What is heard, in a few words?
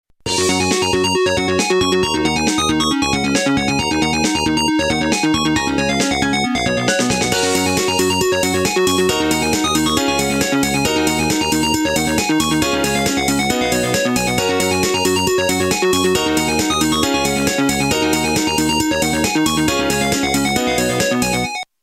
Swimming Nokia